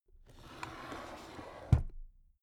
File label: drawer cabinet stuff close soft